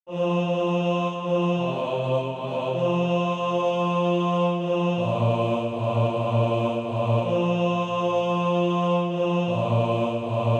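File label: bass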